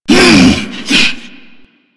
Hammer Boss Grunt, Vocals; Warframe

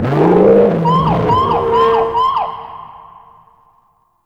Bomber Strafe Run Warning; Warframe; Eidolon; Grn Bomber

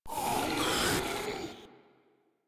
Proto Infested Ancient Vocal Pain; Enemies; Vocals; Warframe

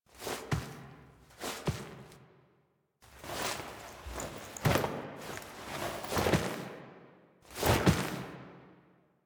Foley C; Drifter Lotus Operator Awakes

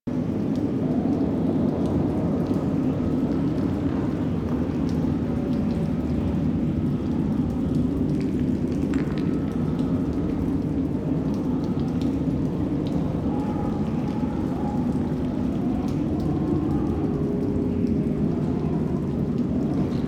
Outpost Cave Amb; Warframe; Ambience; Corpus; Crp Outpost Defense Three

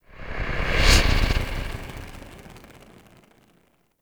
UI starchart planet teleport; DE; Warframe; Starchart Redux

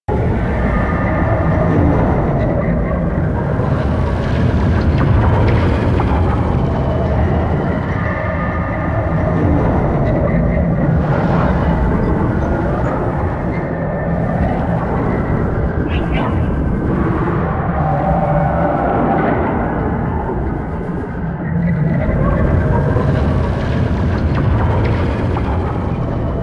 Exterior Combat Background Amb Loop; Ambience; Warframe; Duviri

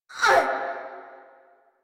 Pain; Grineer Queens Guards; Warframe

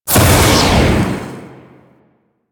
Swarm Tear Dissolve; Warframe; Entrati

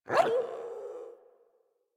Puppy Idle Growl Playful; Warframe; Kubrow

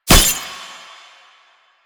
Weakpoint Impact; Enemies; Lasrian Tank; Warframe

